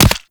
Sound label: Player Bullet Impact Flesh; Warframe